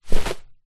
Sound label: raincoat drop down on floor; foley